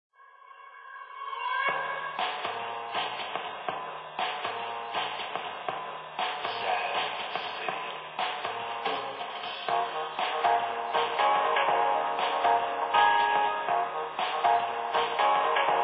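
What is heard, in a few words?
Music Ambient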